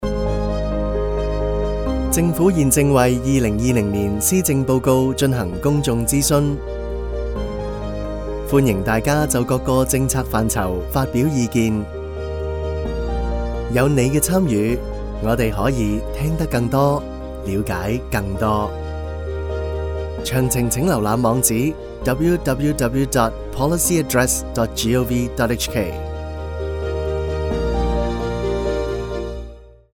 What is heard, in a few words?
Music